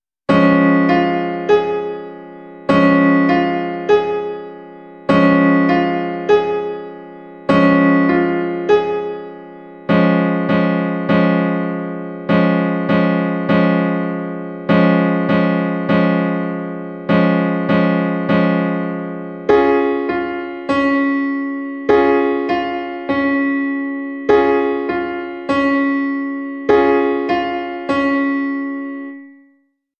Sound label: Db Piano